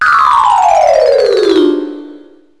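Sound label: xylophone scale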